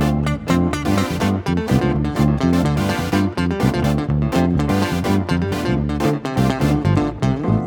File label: Backing, 125bpm Dm, Nu Disco; Zero-G; Construction Kits; Speedy